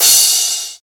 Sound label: big room crash sound c sharp key, Royality free crash cymbal sound tuned to the C# note